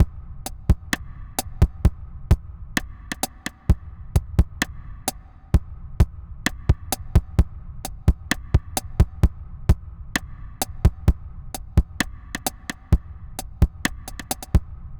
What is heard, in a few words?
Drum Loops, Minimal; Zero-G Smoke